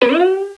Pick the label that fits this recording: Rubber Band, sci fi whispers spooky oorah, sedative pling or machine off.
Rubber Band